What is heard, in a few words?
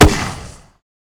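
grenade launcher, weapons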